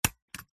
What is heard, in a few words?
office stapler single staple into paper